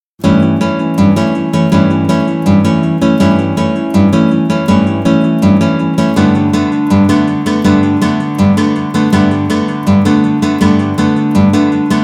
Guitare rythmique, Guitare rythmique isolée, guitare rythmique cordes nylon; Piste 14, guitare rythmique cordes nylon.; Guitare "nylon" à pan coupé; Elle donne le rythme de la bossa-samba en 4/4

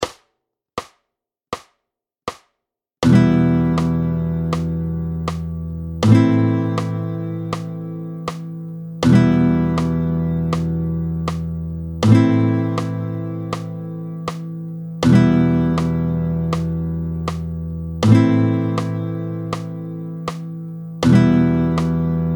Rondes 80, Les rondes, battement tempo 80

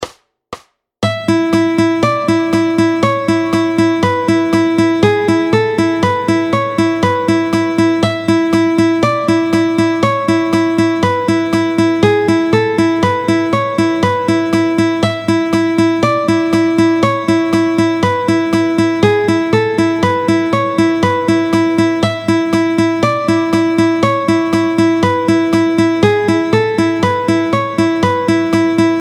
Chanterelle Vite 120, le picking les arpeges aux doigts

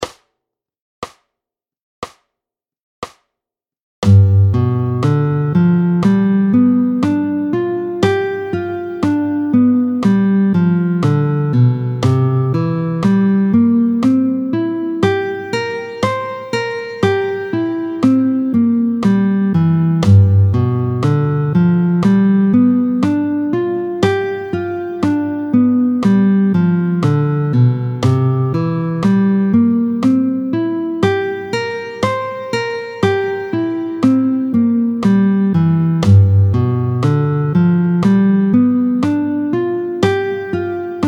Arpège majeur 7 Sol 7 Do 7, tempo 60; arpèges au médiator; Arpèges de Sol7 et DO7 tempo 60; Exercice chapitre 8

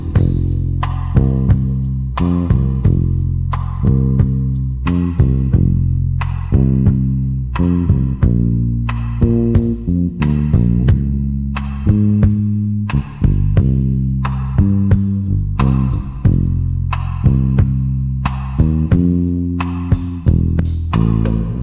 couplet rythm bass, La rythmique basse / batterie sur le couplet

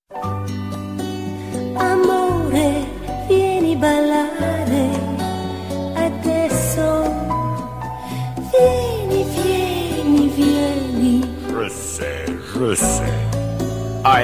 rumba

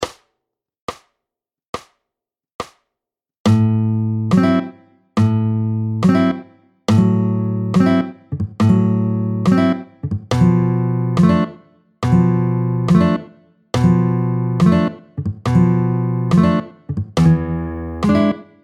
Rythmique Impro tempo 70; Lam6, Rém7, Mi7

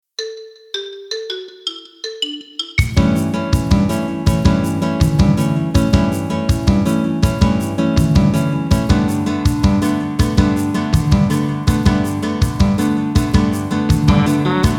Tambour et General, Tambour dans l’arrangement; Panoramique à droite.

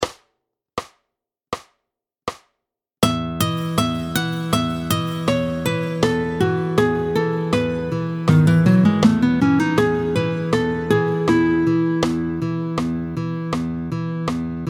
ionien, Le mode de mi ionien, tempo 80; avec un petit bout d'impro

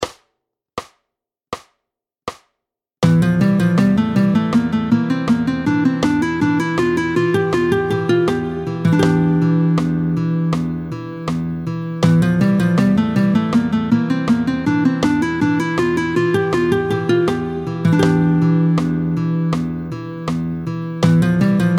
Locrien, Le mode de mi locrien, tempo 80

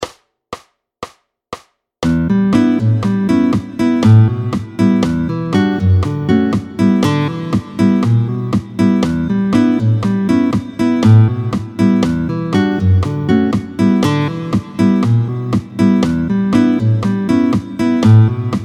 Vite tempo 120

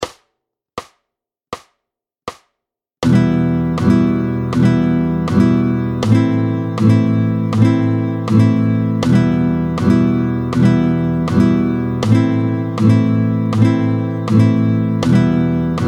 Noires 80, Les noires, battement tempo 80

